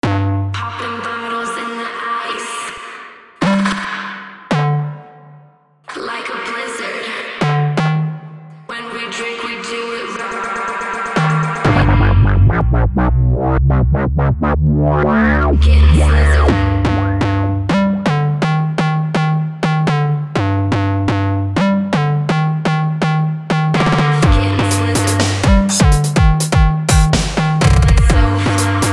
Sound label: remix